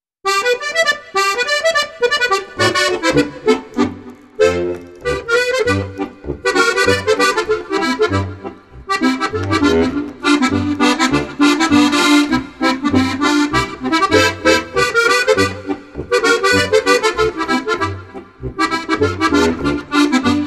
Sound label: swing